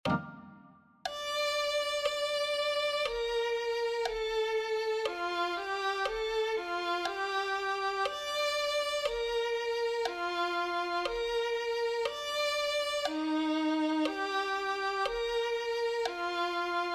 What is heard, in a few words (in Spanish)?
santo espiritu ven violin